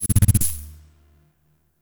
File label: Corruption Pickup Hostile Large Deactivate; Warframe; Zariman; Missions